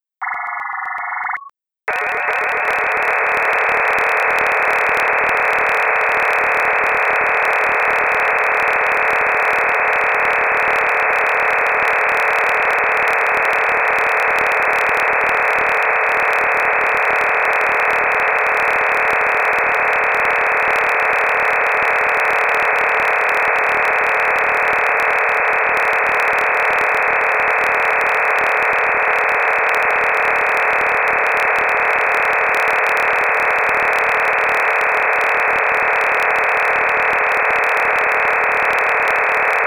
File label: sstv drm; selfiesat